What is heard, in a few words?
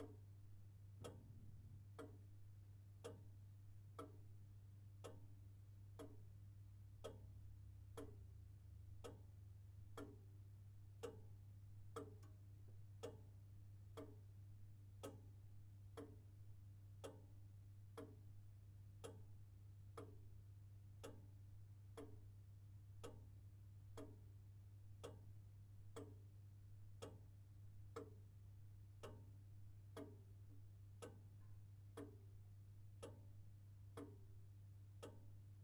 Room